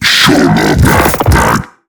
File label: Hand Bomber Idle; Warframe; MiTW; Battle Barks